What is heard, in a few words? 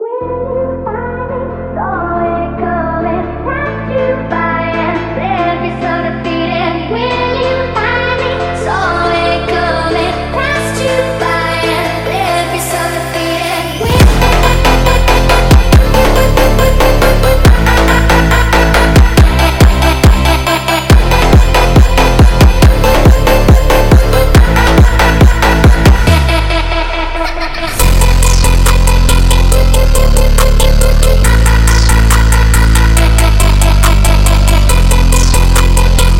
Speed up